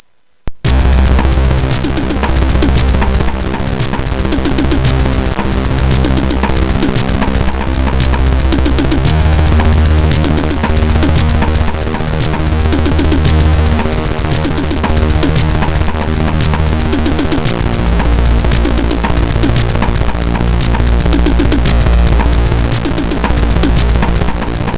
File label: Drummer